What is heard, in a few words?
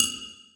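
triangle muted, percussion; Sonatina Symphonic Orchestra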